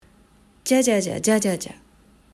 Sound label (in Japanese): 三連符セット